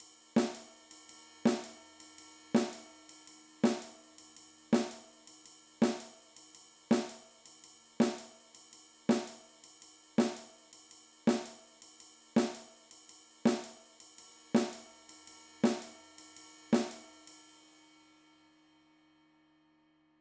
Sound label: Simple Snare Drum Comping